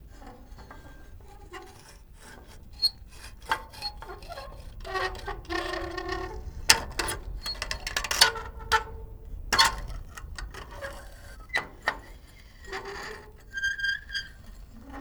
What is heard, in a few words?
Gate